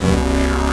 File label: bomb; Sir Bombalot; 501 Great Games - Volume One; Guildhall Leisure Services